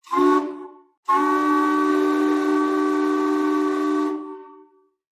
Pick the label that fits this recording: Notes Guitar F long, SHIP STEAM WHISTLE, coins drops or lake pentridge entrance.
SHIP STEAM WHISTLE